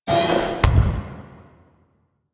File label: Push Action Start; Warframe; Duviri; Glyph Puzzle